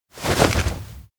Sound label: duckboat land head; anemoi